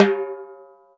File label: TIMBAL HI; Drums&Percussion; E-MU Producer Series Vol. 7 – Old World Instruments